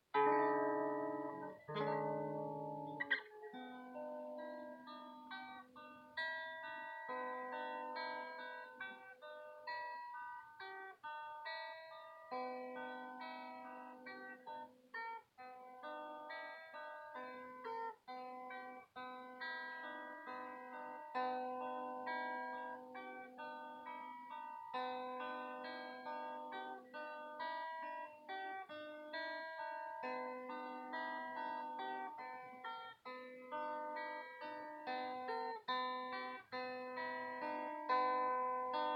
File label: background noise